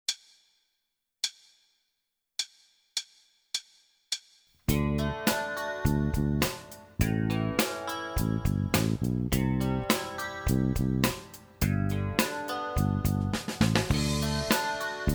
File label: Pattern Rock In 1/8